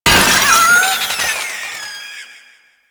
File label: Infested Cat Loot Crate Destroy; Warframe; Nineteeen Ninety Nine; Cat Loot Crate Common